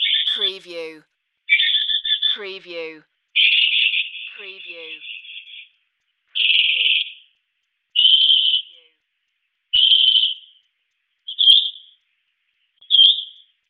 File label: Bird Noises